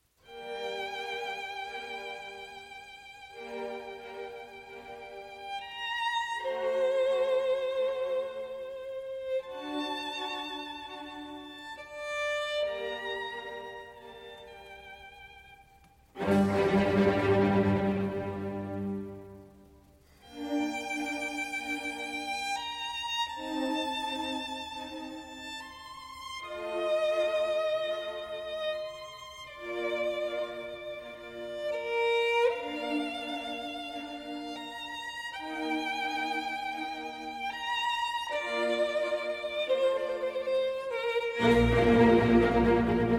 Violin Concerto in G Minor, Adagio - Presto; Op. 8, No. 2, RV 315; L'estate; Vivaldi; The Four Seasons

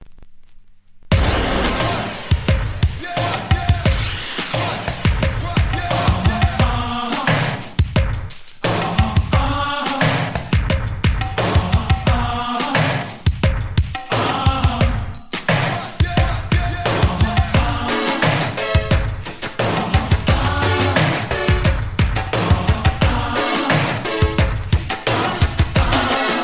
(Instrumental Version)

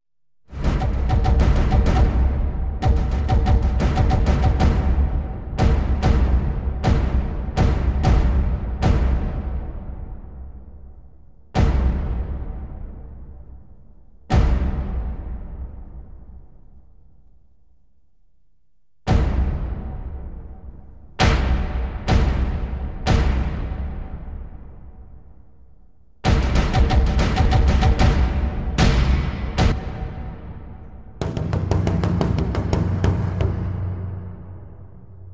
Percus; Troy

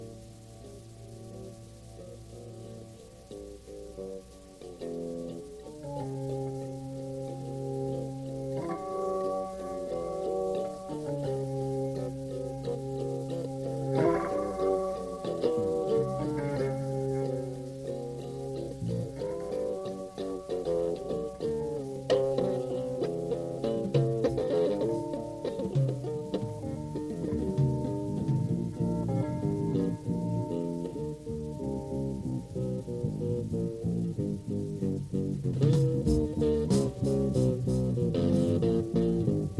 Fuzzy Guitar Jam

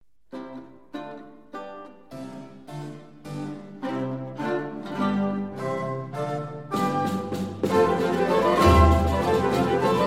Tordion; Musique de Ioye; Tordion 2; Renaissance

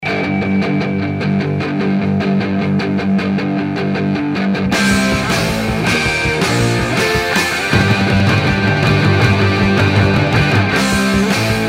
Guitar (same source)

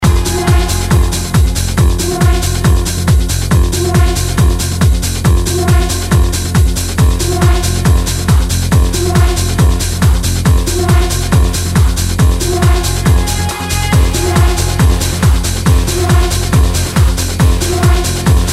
Trance; Club Island Summer 2003; Cream

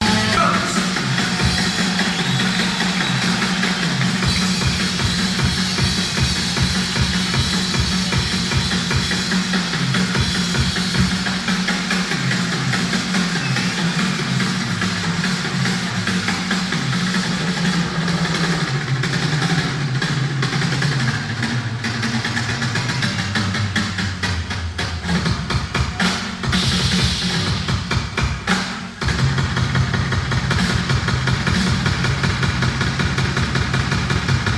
Drums Solo

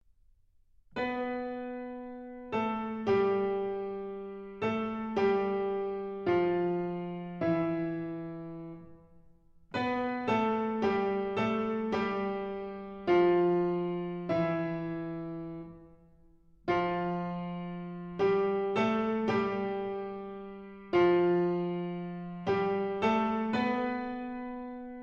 Dotted Notes, Piano; Mikrokosmos, Sz. 107 - Book 1; Bartók

